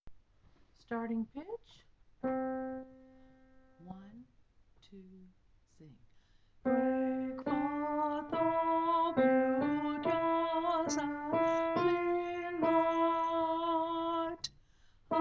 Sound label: Alto